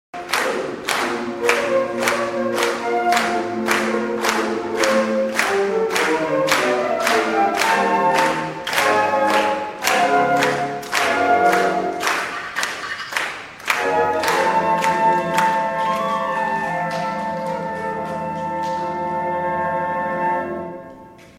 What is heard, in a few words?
consert, flute; arietta-flute